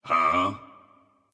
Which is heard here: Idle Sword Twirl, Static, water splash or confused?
confused